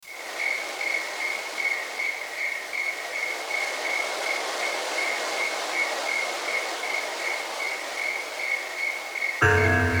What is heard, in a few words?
Japanese Bells, Relaxing Spa Music